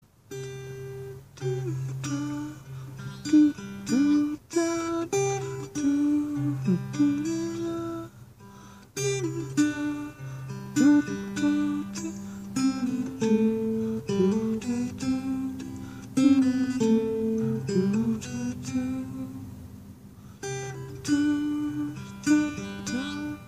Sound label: lullaby with silvertone parlor guitar